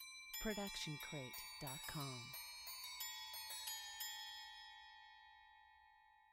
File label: Christmas Bells; SoundsCrate